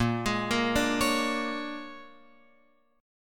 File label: A sharp 7th Sharp 9th, Guitar, Open F; arpeggiated; chord; JGuitar